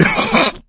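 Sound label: laughter, male; Left 4 Dead 2; coach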